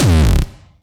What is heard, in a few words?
Yamaha EX-5